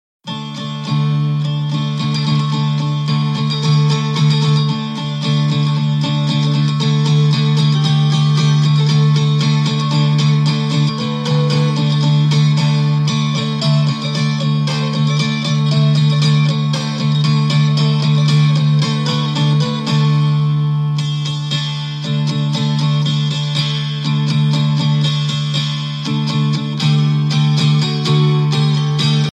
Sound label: mountain dulcimer